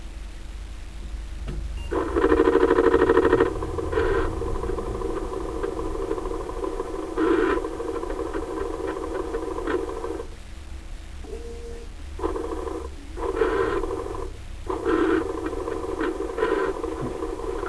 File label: Disk II Pascal Start, Noises; A2 LOST N FOUND CLASSICS